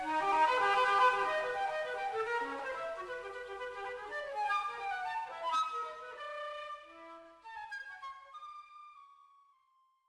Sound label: flute; nueva cortina b